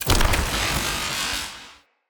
Cannon Tesla Equip Charge; Weapons; Player

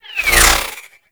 Cluster Shot Whizzby; Enemies; Abilities; Warframe; Teralyst

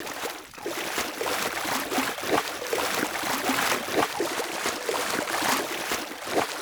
Horse Steps Trot Loop Water; Warframe; Duviri Horse; Vehicles

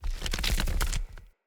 Stone Move Large Stop; Puzzles

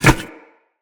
Wall Grab Metal Foley; Tenno

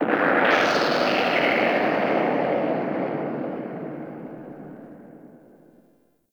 Exterior Deep Space Booms, Ambience; Warframe; Railjack

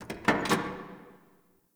Parking Lot Car Door; Ambience; Warframe; Nineteen Ninety Nine

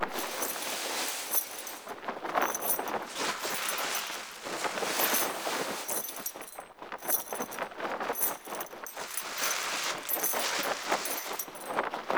Bombastine Foley Loop; Warframe; Duviri; Ambience

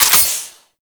Pistol Reload; Guandao

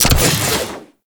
Scythe Heavy Attack Charge Start; Weapons; Melee; Warframe; Archwing; Exalted Archwing Scythe

